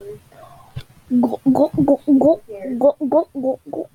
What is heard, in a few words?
gulp gulp gulp gulp